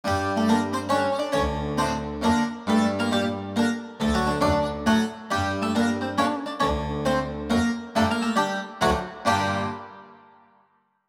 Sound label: Little Zither tune